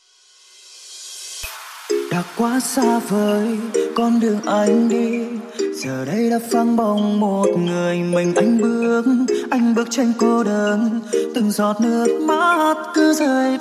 Nhac Chuong House

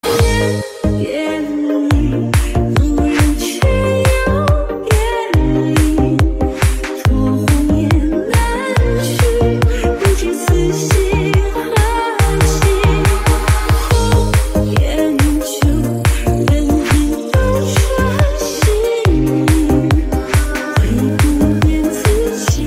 nhac chuong remix

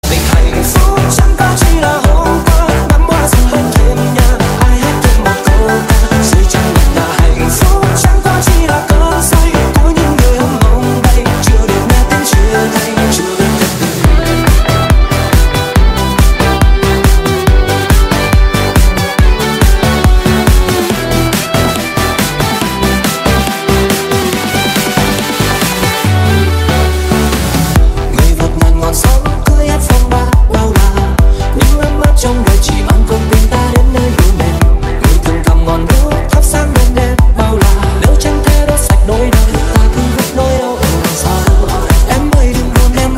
Remix; nhac remix

